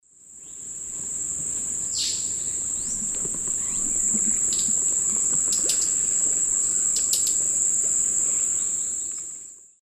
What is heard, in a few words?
Chloroceryle inda, calls; S. America; Eastern Lowlands